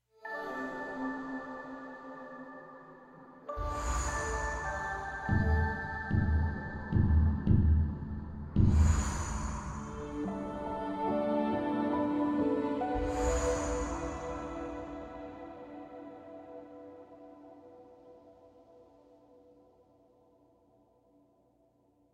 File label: Cave Music Crystal Form; Hermits